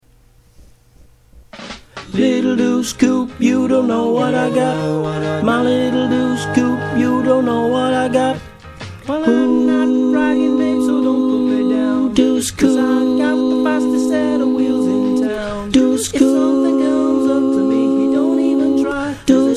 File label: Alto; Choir Harmonix; Harmonies